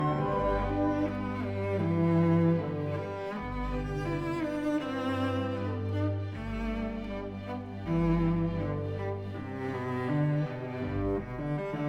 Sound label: Cello Concerto in G major, A tempo; Romantic Cello Concerto; Pfitzner; Hyperion